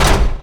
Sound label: Door Large Metal Stop; Doors